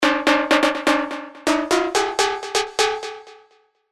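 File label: synth, old skool synth; Control Freak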